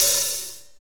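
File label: hi-hat, Techno Rock; Northstar; Drumscapes Roland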